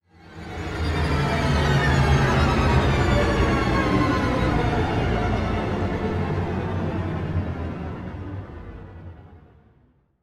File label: Tension Violin Down